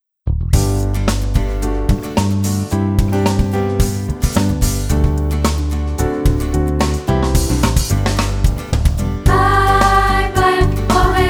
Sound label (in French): Chanson